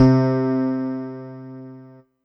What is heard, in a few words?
Yamaha EX5 Tine EP C4